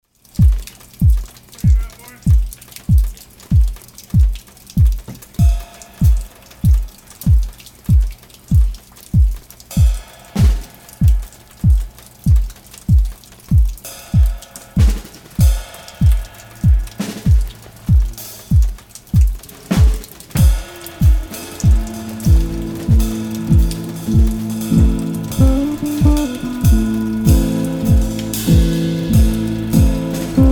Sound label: Horse In Rain